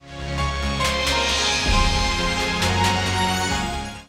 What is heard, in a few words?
Electro; Electronic; Original Signal